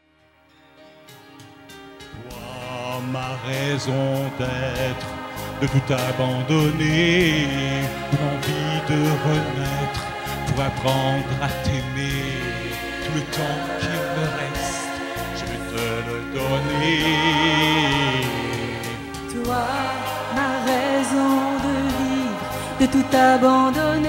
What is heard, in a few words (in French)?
Seulement l amour SATB Duo